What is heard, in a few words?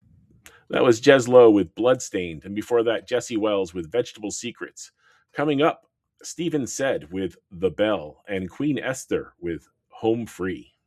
Break